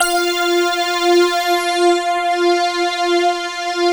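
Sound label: synth Strange; Best Service Gigapack 1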